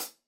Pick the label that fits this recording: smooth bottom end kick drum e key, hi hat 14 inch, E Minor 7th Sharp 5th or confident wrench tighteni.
hi hat 14 inch